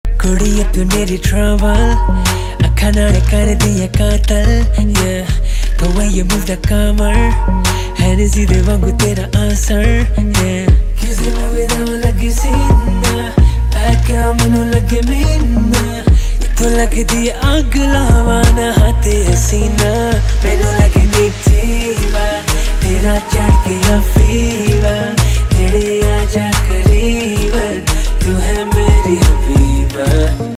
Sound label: Desi Punjabi Ringtone